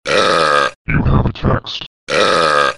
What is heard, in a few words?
BURP